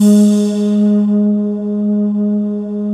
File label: CLOUDS G#2, VOXY; Best Service Alpha Dance vol.2